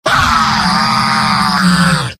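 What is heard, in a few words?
demoman pain critical death; mvm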